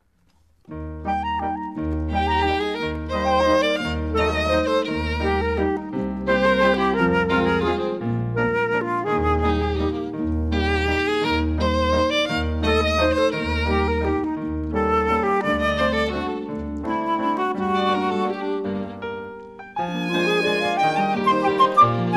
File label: Waltz